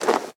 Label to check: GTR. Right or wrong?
Wrong